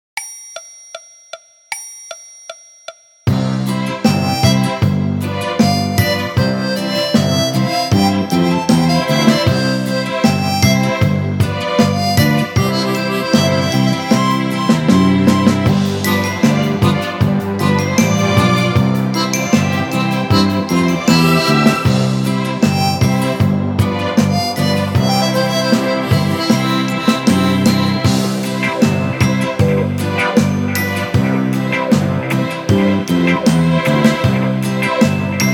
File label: Orchestra Version